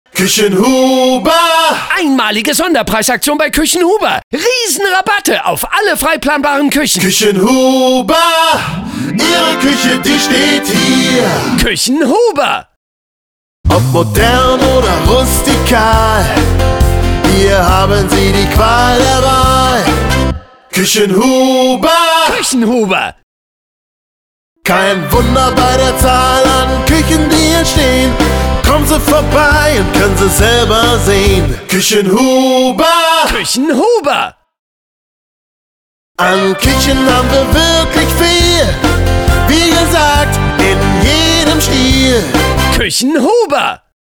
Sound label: Jingles; Sahneschnitte; radio days